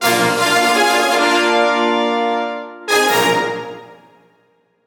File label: Fanfare BPM170 Bb; 4/4; Brass + Percussion